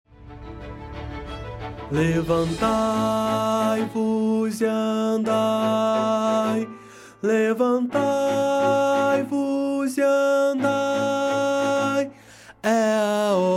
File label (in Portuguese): BAIXO; hinos